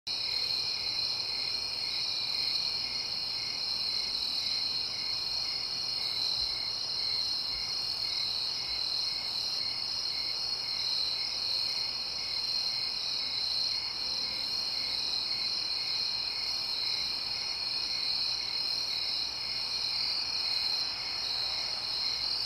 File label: Nightime Sound Effect